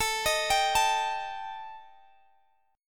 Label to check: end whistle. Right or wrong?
Wrong